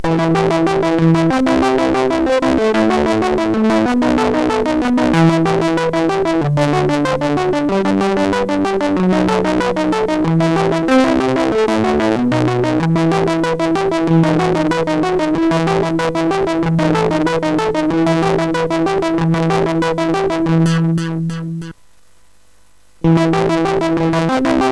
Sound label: Instrumental Compositions, sid MOS6581R4